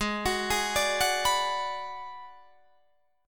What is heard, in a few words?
G sharp Minor 7th Flat 5th G sharp down Guitar Drop D